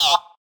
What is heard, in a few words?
Toaster